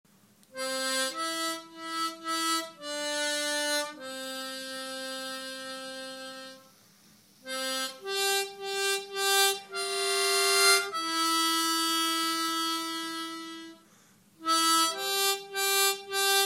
Пример «Несе Галя Воду» интервалами, губной гармошке

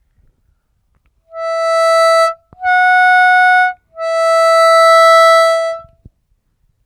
+5+5o+5, овербенд, губной гармошке

